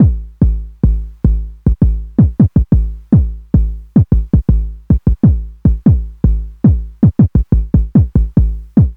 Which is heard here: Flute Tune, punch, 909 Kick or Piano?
909 Kick